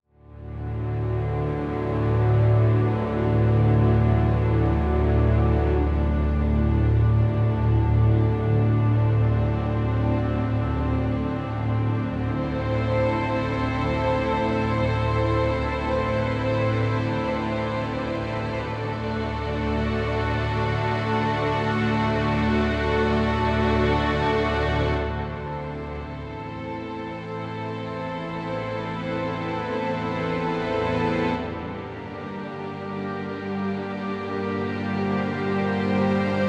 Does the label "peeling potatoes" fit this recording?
No